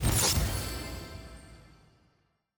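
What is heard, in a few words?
collection notification set complete; lol collections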